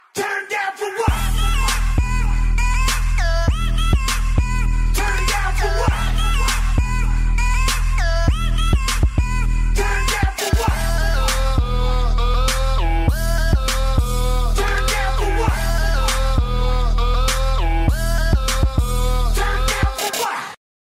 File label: Mobile Ringtone; Android Mobile